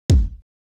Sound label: Kick, Yamaha PSR E305